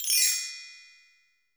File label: Bell Tree, Roland SC-88 drums